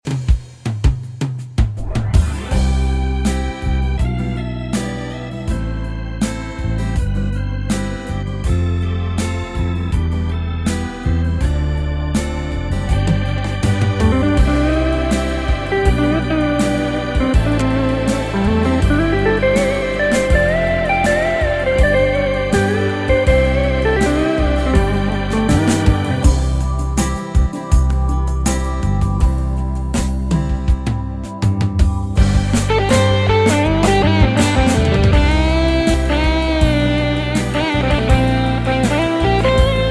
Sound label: pa3x major intro ending; ritim yaziminda akor ve sololar